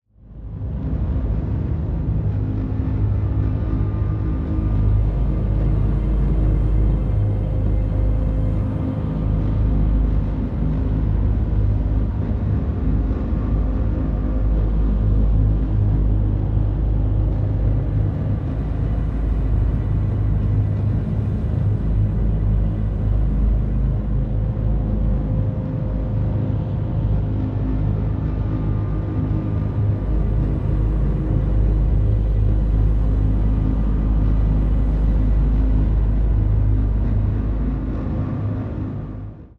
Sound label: Drone